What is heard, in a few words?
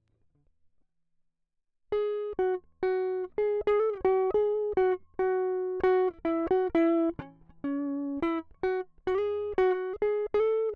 Small Bright Combo; logic